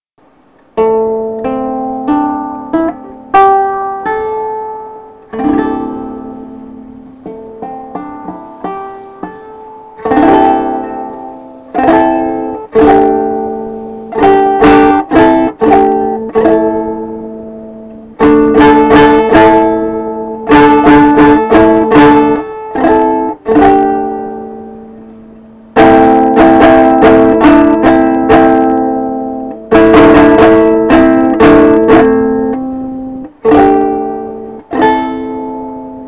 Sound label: pentatonic, lyre